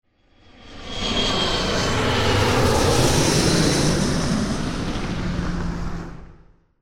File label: 757 Landing